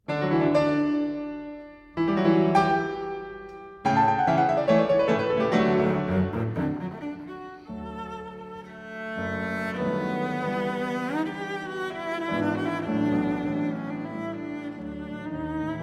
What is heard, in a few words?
Sonata for Cello and Piano No.5 in D, Allegro co